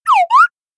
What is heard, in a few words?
notification; samsung Aleart